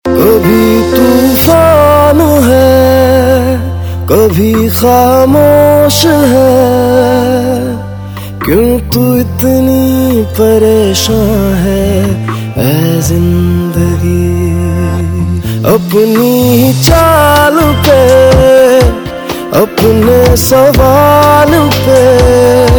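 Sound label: Ringtone, Bollywood Punjabi Pop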